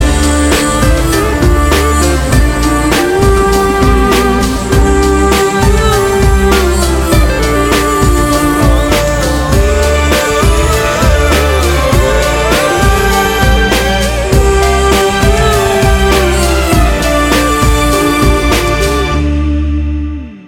Musical Tone, Tamil

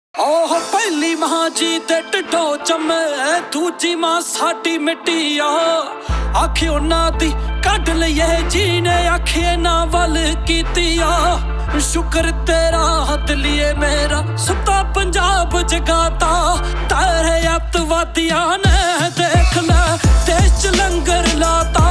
Desi Punjabi Ringtone

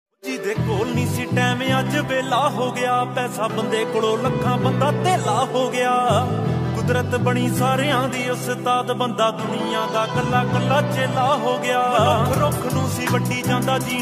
Desi Punjabi Ringtone

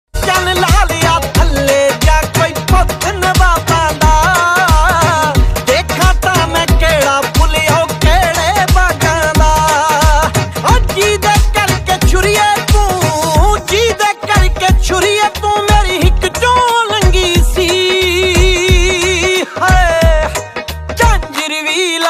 Punjabi Beats Ringtone

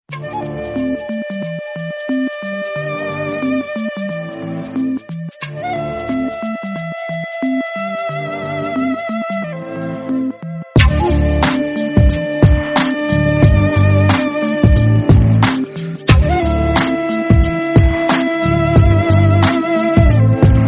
Flute BGM, Telugu Ringtone

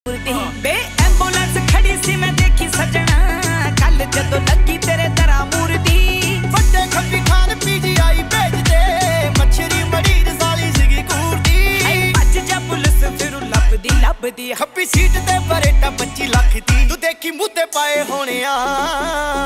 Punjabi Ringtone

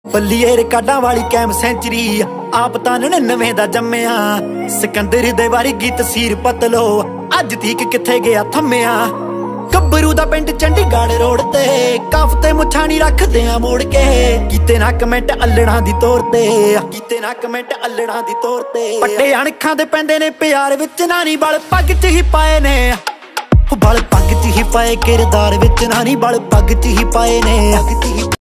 Desi Punjabi Ringtone

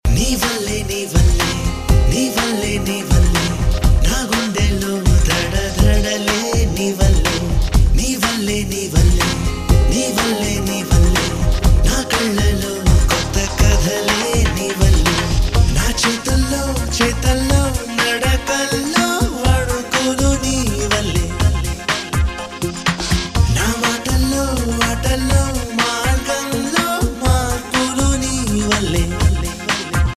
Male Telugu Ringtone; Annavaram

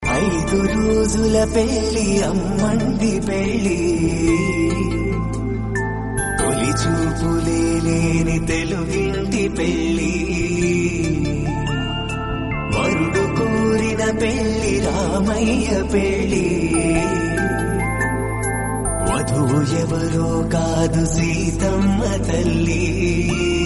Telugu Ringtone

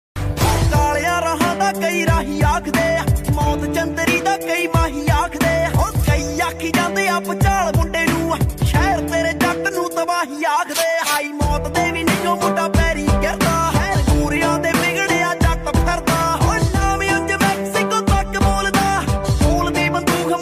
Desi Punjabi Ringtone

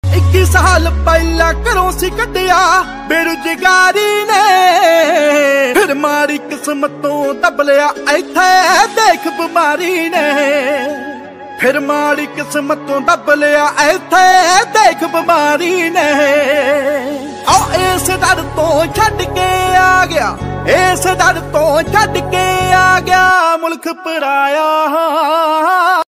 Punjabi Song Ringtone

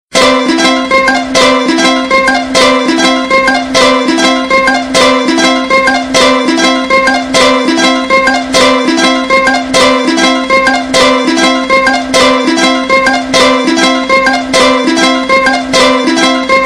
Happy Sound Ringtone

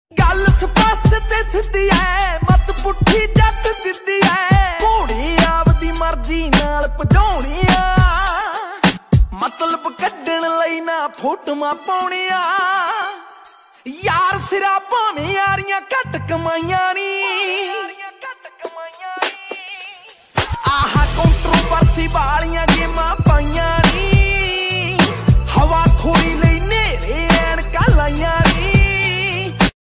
Dance Punjabi Ringtone

